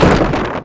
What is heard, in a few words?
wood